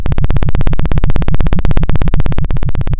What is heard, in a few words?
Sound of a helicopter